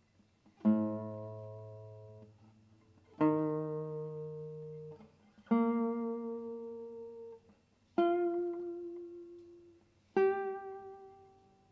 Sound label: Vibrato